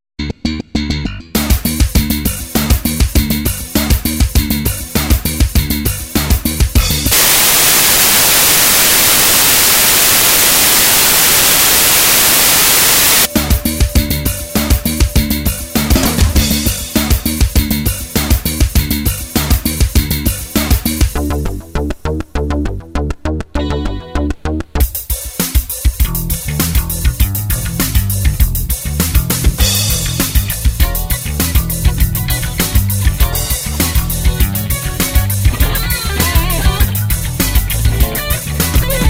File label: Funk